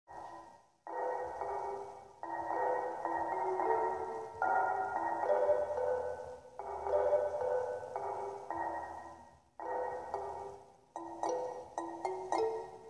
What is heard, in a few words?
Ultrasound